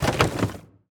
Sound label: Loot Chest Shake; Android; Portal Quest